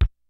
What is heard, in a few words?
kicks snare, kid; Kid Nepro; Elite; KICKS SNARES